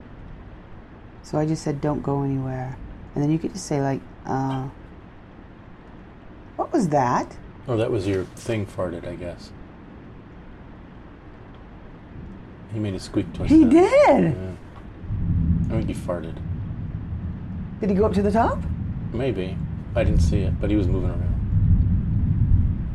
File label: axolotl fart; Halloween